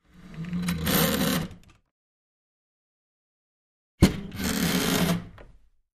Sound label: Door Furniture, Falling, Open, Close; hinges & creaks